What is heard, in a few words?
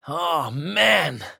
battle pain; dqxi; erik